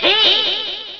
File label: Heee, Amiga Soundtracker; ST-XX Amiga Soundtracker Sample Packs